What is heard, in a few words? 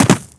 Frag Sound; VM Viper